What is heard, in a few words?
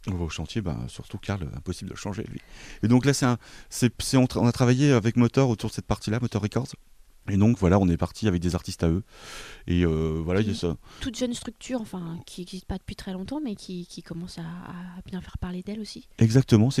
electro; chantier